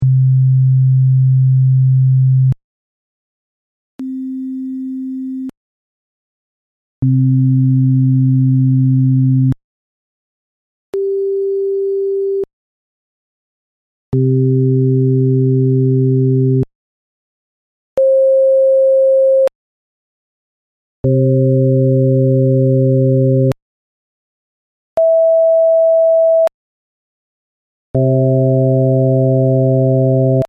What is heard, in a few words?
C Harmonics Added